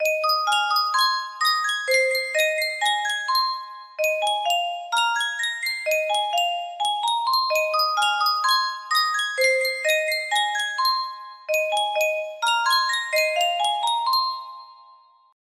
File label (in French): Yunsheng boite a musique